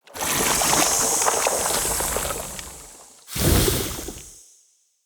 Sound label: Corrupted Sproutfolk Heal Sludge; Enemy; Combat